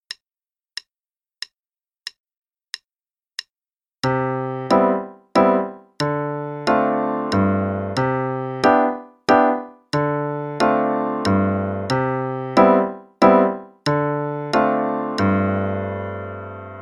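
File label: Ferris Wheel Improv (quarter note=92); Level 1 - 2nd Ed; Faber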